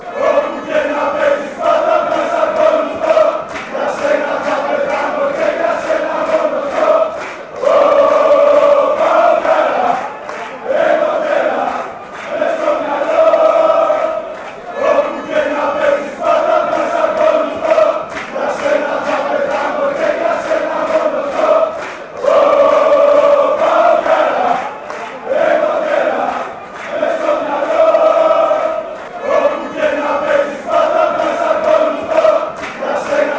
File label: chant, Greece; paok fc